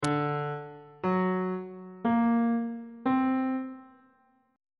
c double sharp augmented 7th chord intervals on bass clef